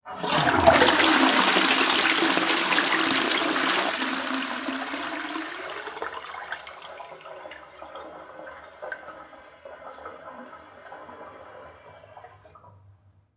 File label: toilet flush